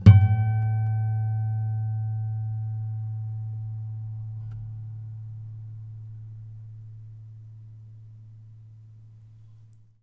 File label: harmonic, strings harmonics